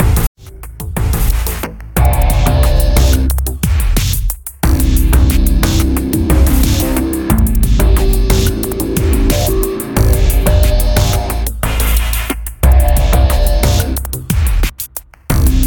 espionage sting; AirMech Command VR

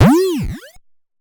Warning

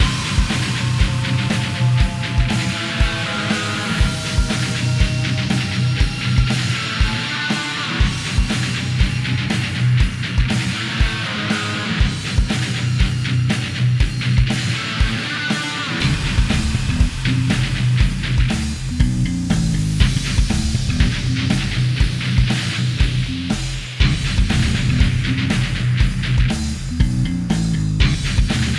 Boss Battle Music Loop

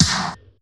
SMG Fire; Cruelty Squad

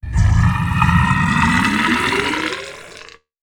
Acid Vomit; Cruelty Squad

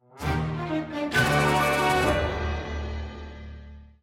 Level Failed; Scrubby Dubby Saga; Android

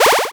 Menu SFX - Confirm; trip world dx; gb

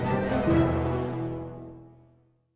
Music Dialogue Dramatic End 64bpm

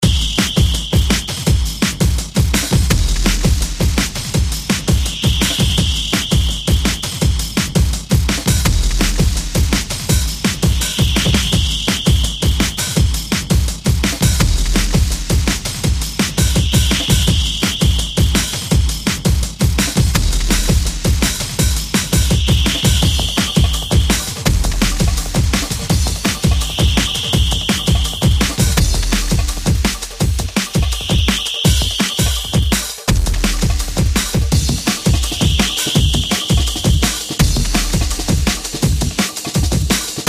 Music bpm167

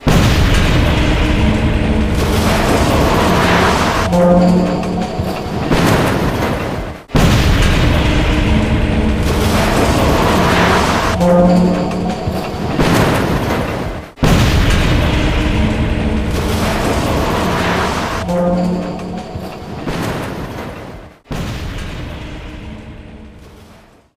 Crane Collapse; Alien vs Predator Requiem; PSP

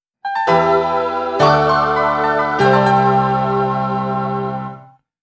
Game Over; nmk arcade sound digital collection vol. 2

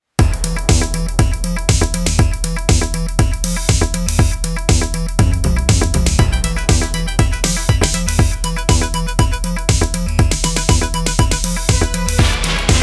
Cinematic; Lastfight

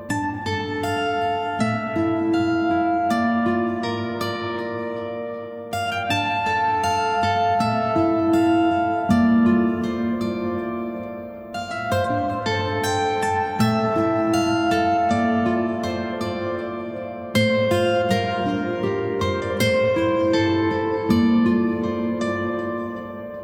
Music Angelic Touch; Dungeon Punks; ps vita ps4 windows xbox one

